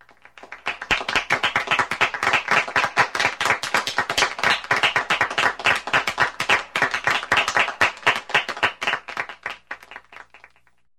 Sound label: applause